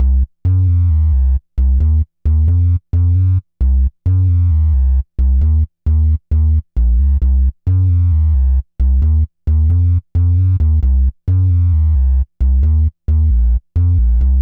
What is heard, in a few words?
Bass, Breakbeat